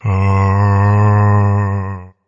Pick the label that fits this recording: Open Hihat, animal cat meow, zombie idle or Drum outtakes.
zombie idle